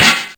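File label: DRUMTRAKS hi-hat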